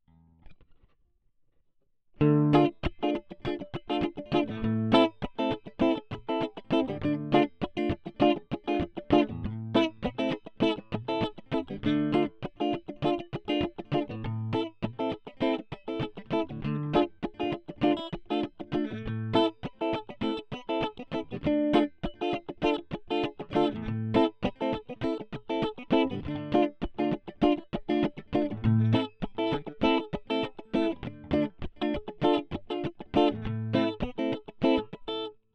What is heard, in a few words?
Small Bright Combo; logic